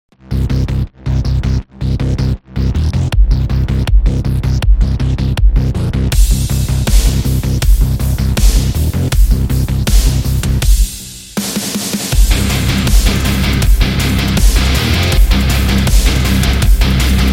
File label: German Hardness